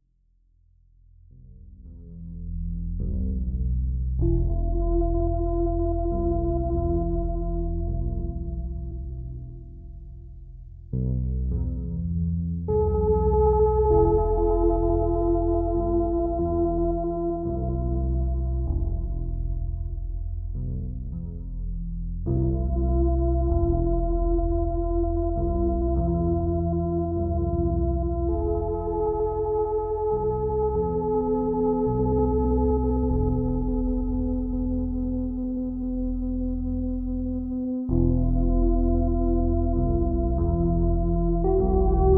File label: solfeggio harmonics A 285 Hz